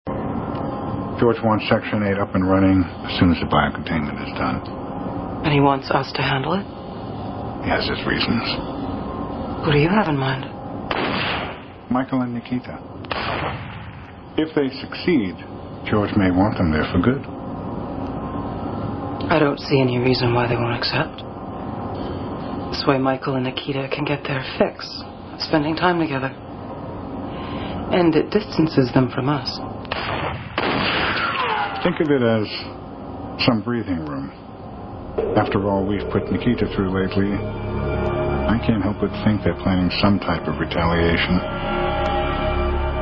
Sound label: Gunfire